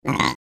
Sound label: Goblin funny burp